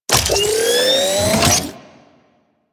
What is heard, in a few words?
Rifle Alt Fire Charge; Warframe; Weapons; Corpus; Crp Ruban Rifle